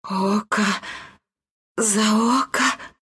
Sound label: death; dota2